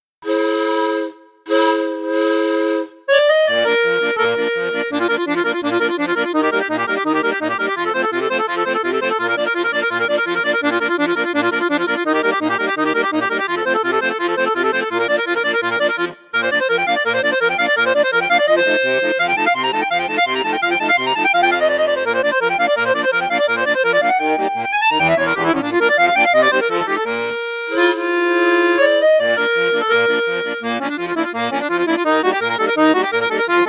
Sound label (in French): registre 8 8, accordéon; bugari 580 ars c